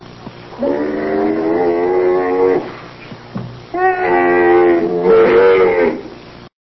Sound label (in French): dromadaire